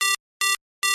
mobile phone tone

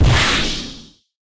punch high

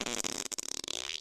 windgas

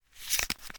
card pick

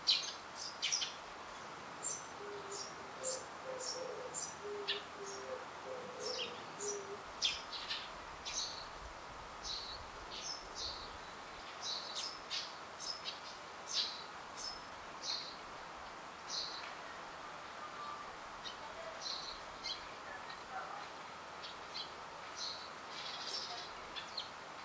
quiet residential area

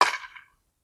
wooden bat

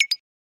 decision, button

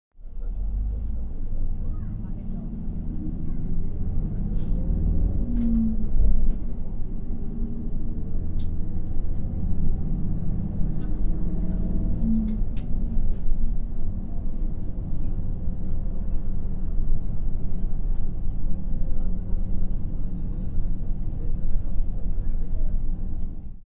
bus start, machine

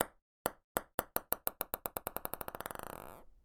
table tennis ball

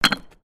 brick

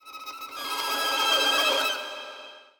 violin horror

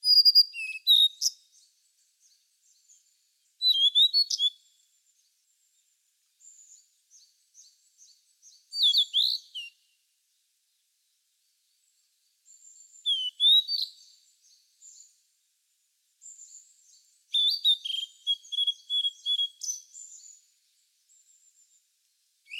cyanoptila cyanomelana twitter

